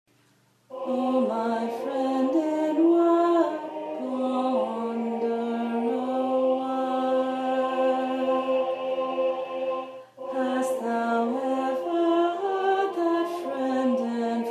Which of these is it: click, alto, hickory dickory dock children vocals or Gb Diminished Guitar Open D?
alto